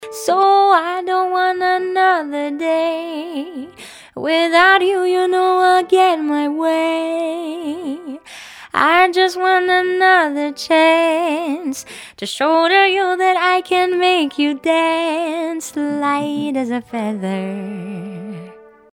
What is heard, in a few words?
Pop Vocal Dry